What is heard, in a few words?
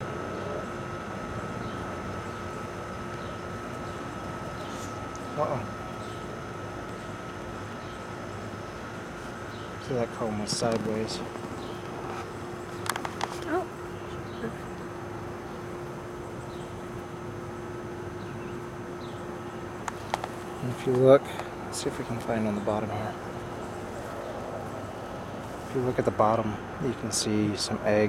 inspection, bees